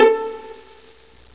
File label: VIOLA A; MUSIC; INSTRMNT